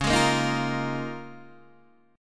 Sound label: Db 6th Add 9th Db, down, Guitar Open D